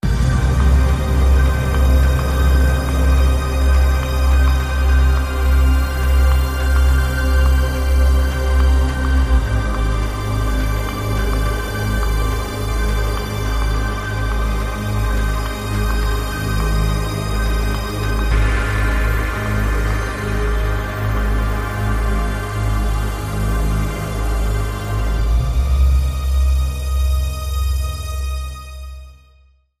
Ambient

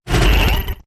Quick Grit Burst

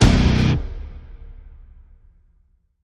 Stab Guitar Single (B)

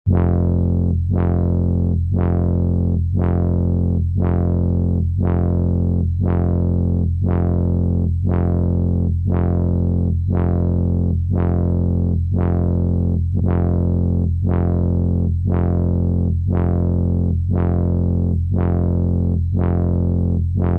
Bass Pulse

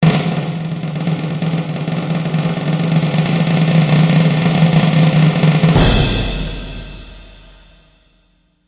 Anticipation Roll

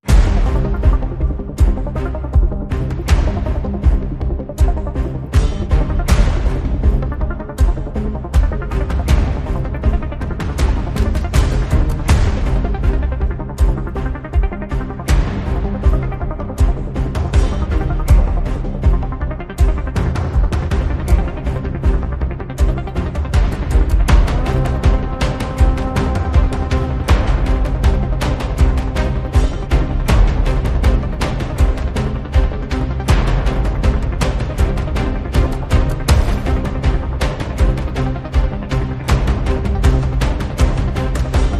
Obsessed Profiling, No String Chords

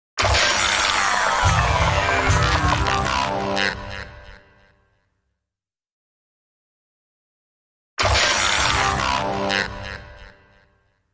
Gritty Stinger

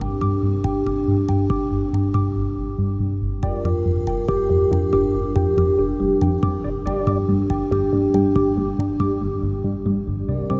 Loop No Drums

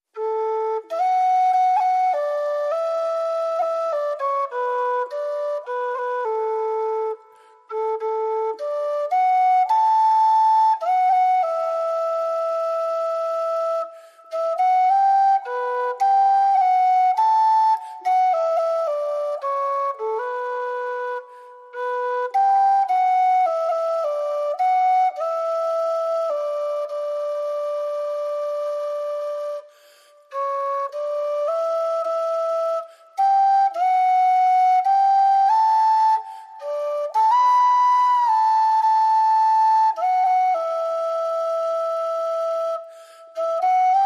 Tin Whistle Solo; 60sec

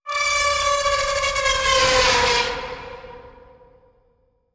Demon Scream

